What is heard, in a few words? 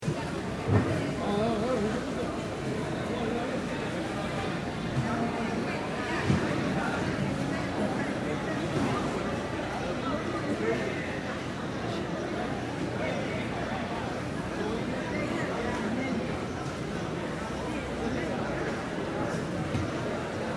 Crowd Market Costa Rica Background Shout Outs; Stores and Malls; Big Room Sound